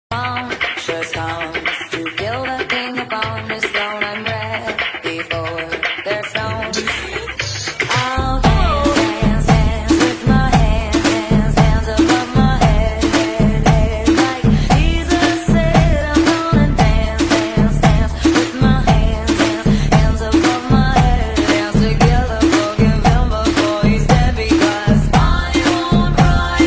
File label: drums remix ringtone; villain beats